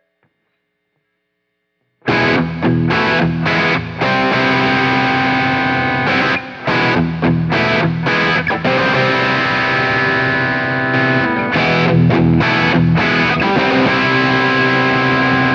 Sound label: bridge thornbucker